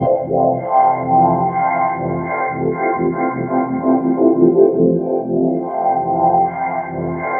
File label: ECHOPLEX